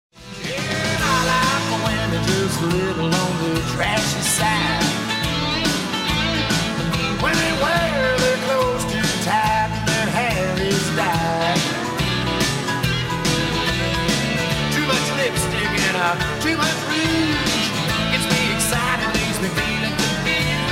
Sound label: 60 Sec Music Bed